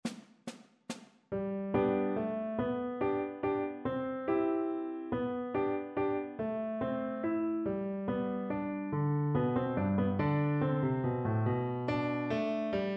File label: Menuet G vn pf accompaniment; Bach